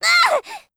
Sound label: aiighhh